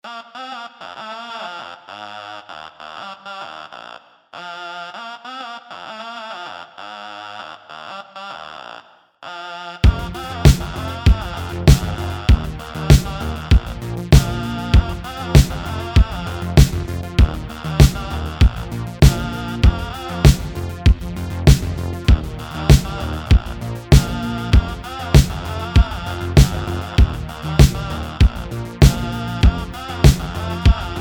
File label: Instrumental